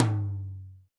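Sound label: Tom Md Dynamic, Alesis D4 drum machine; 300 Drum Machines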